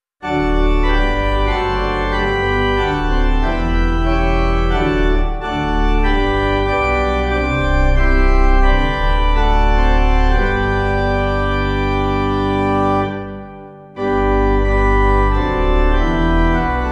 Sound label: Deganwy Pipe; Strengthen For Service